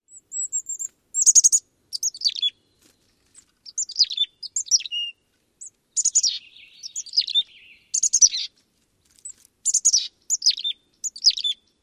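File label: calls, mountain chickadee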